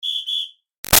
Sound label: Whistle Sports Hard Blow Short Double; Miscellaneous Sports